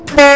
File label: klaxon, class 66 train; OpenBVE